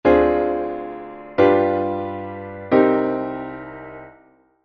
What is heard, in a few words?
minor two five